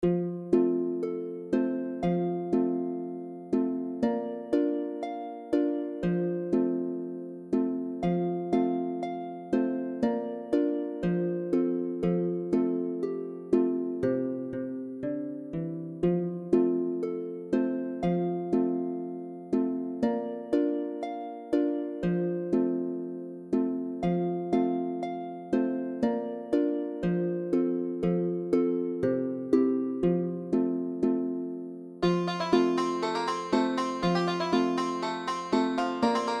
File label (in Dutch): de banjospeler